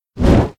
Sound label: Flapping; Bahro